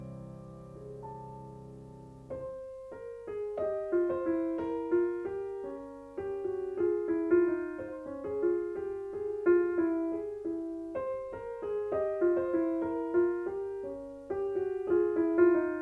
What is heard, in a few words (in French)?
pour piano variations sur un air populaire ukrainien; RTBF